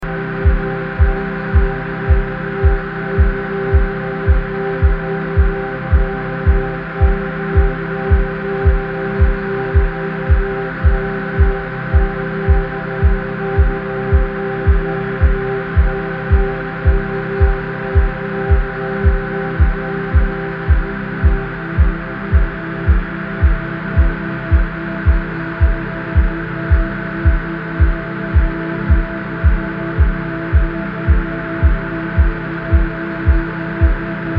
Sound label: low howl dusk